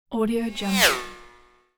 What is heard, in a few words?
space motorcycle by